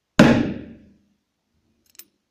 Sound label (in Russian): Удар барабанной палочкой